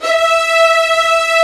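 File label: violins E 3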